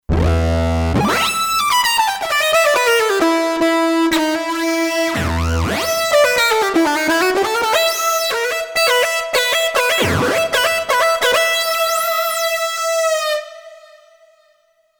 Kurzweil K2500X Power Lead

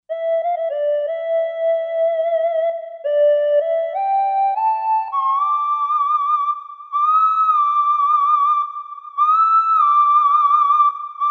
SINE LEAD, Roland SC-880; Factory Patches

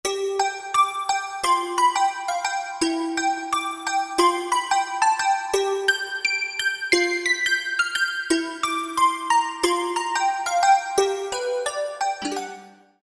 Snow Bells, Roland SR-JV80-01 Pop

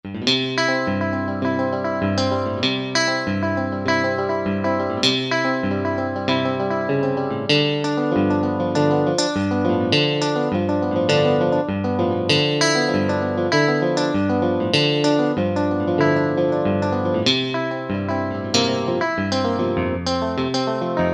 Clean Pick

